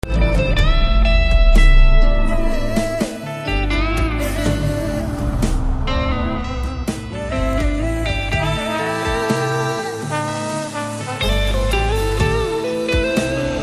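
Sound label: Love background music Ringtone; Radhe Shyam; Telugu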